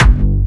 Jump Kicks, Bassdrums

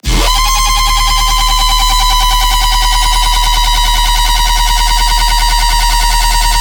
BASS SHOT D#

